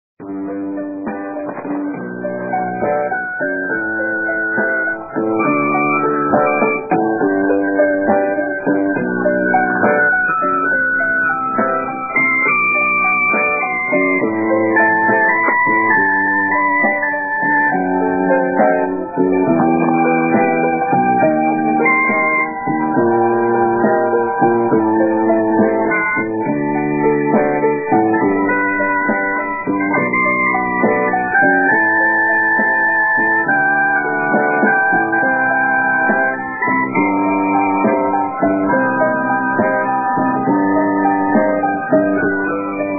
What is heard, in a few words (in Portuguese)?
romantica